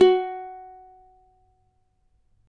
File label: CAVAQUINHO F#, Guitars; Spectrasonics; Hans Zimmer Guitars VOL-2